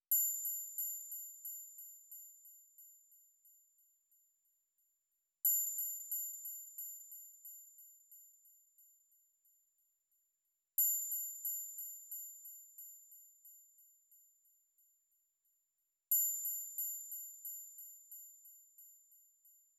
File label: Drum Loop - 90 BPM Triangle, RnB; Distraught; Cymatics; ANALOG EVOLUTION Beta